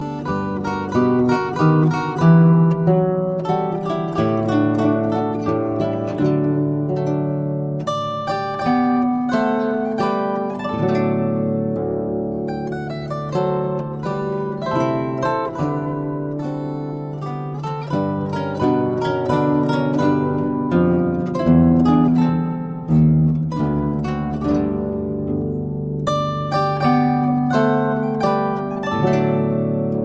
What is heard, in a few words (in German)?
Menuett, zither; klassik